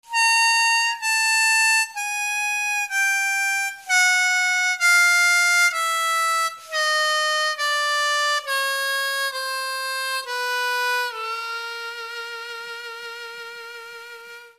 Overblow, harmonica

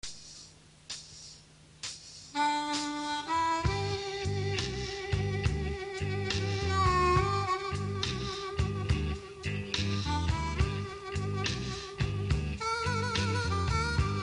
harmonica

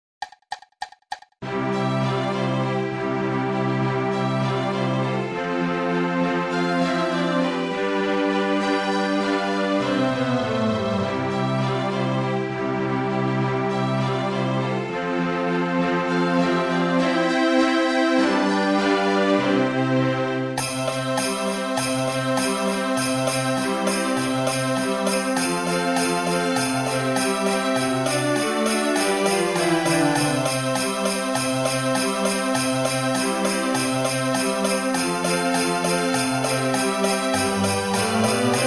jingle bells c, harmonica

